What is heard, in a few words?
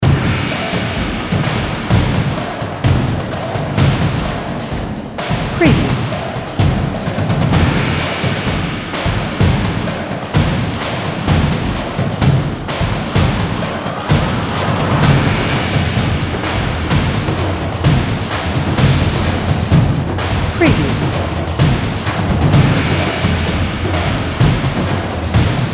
Cinematic Hyped Action Drums Trailer Intro